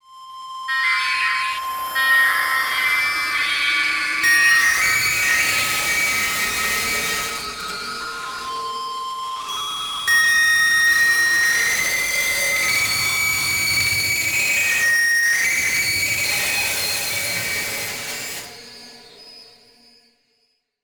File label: Screech Digital Noise; Adobe; Production Element; Imaging